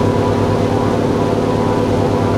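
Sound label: an2 cockpit left, engines; War Thunder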